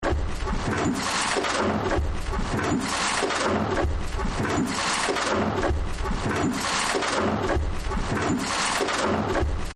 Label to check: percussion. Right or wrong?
Wrong